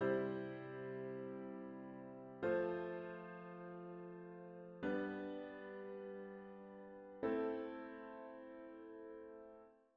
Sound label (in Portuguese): Estudo de Harmonia acorde de sexta cadência deceptiva